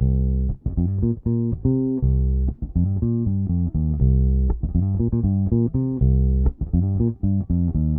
Bass, 70s Analog Funk

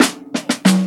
Roll, Drum Rolls; Jungle; Zero-G Jungle Warfare 1, 2 & 3; 90 s Sample CDs

